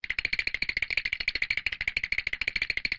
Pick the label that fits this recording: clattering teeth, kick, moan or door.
clattering teeth